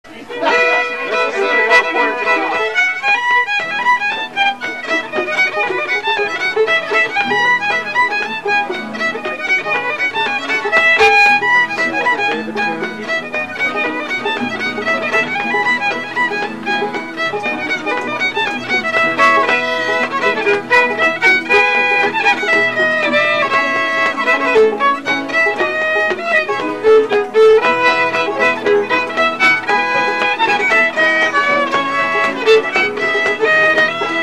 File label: E Reel